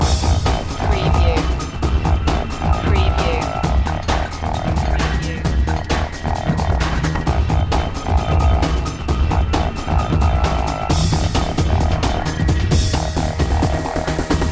push and pump loop

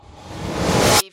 Button Sound - Metal Fence Hit

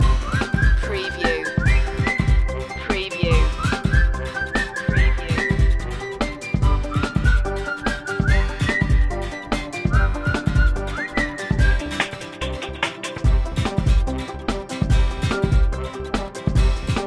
playful march loop

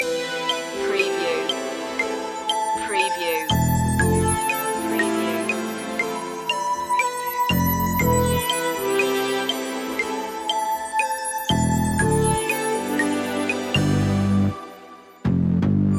it girls loop

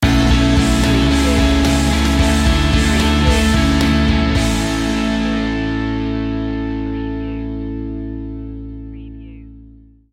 only time stinger

